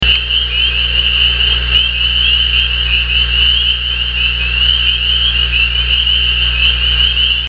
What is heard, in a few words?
Pseudacris crucifer; voice